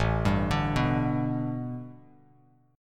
A 9th Suspended 4th Bass Standard, chord

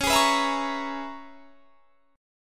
Db Minor 13th, chord; Dbm13 strummed; JGuitar

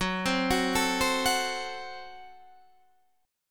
Gb Diminished Guitar Open D

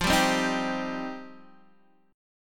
F 7th Suspended 2nd, Guitar Open D; x,8,5,6,6,5

